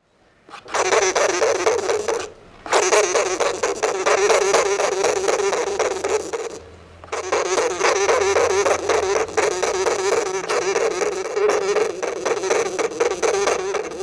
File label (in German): Siebenschlaefer Rufe; Siebenschläfer; Siebenschläfer, der gute Hausgeist; Seinen Namen verdankt der kleine Nager der Mär, dass Siebenschläfer sieben Monate Winterschlaf halten.